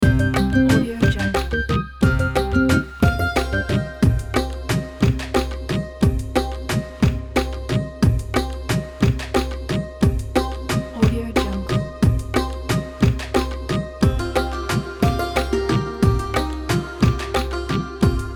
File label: bollywood classics